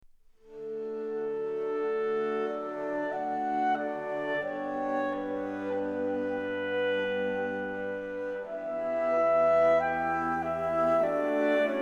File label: Clarinet Quintet in A Major; Mozart